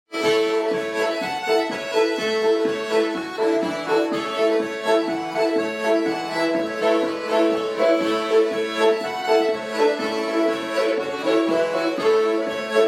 polka; bbcradioscot youngtrad

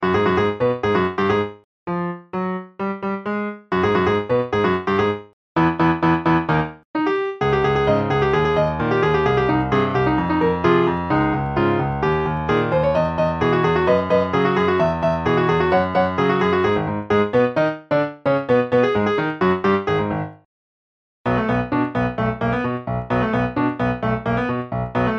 opening 1 piano

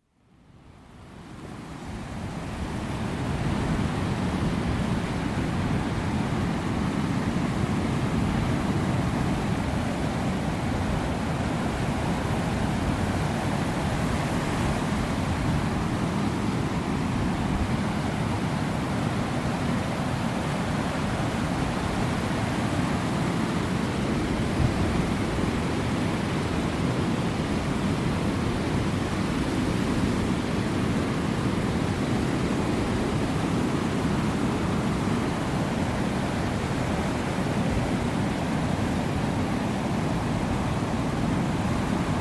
soundscape at the beach

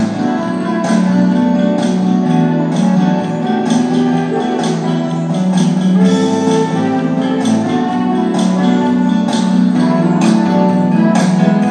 cheesy keyboard busker at Euston

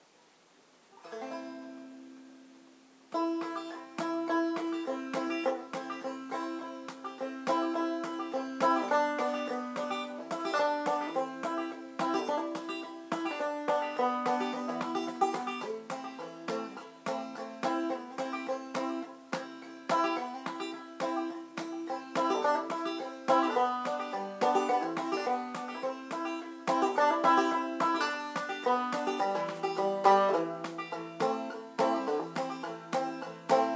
shady grove on the banjo; rutherford banjo

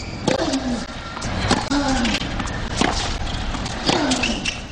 tennis grunt; number 10

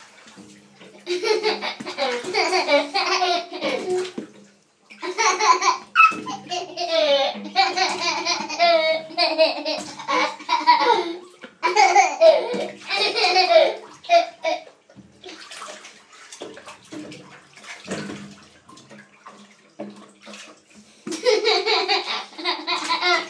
laughing at flying ducks in the bath

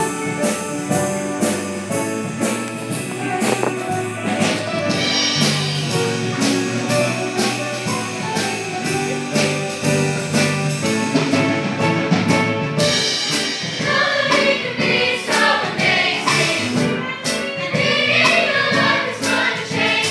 youth choir concert rehearsal

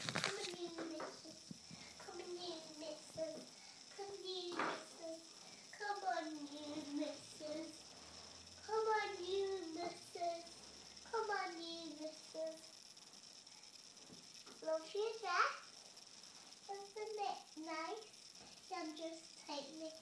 small girl playing with toys

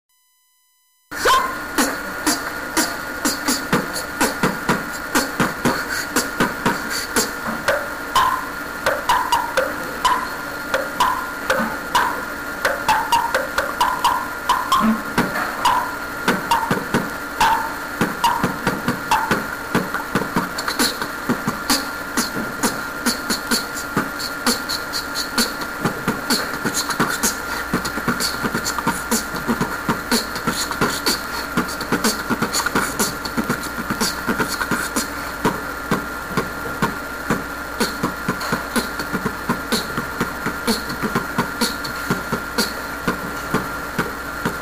beatbox

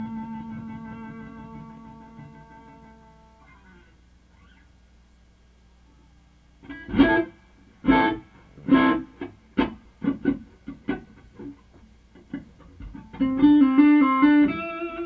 guitar takes

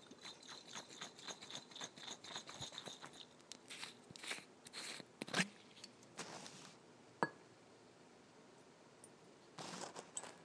vermouth, kitchen; soundsemioticsofthekitchen

